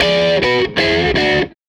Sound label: Tele Guitar Loop